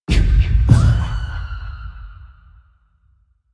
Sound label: Kikikimamama Scream